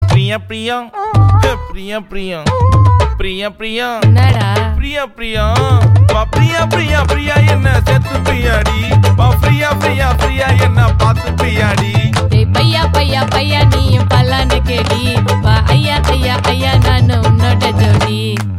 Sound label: Funny Ringtone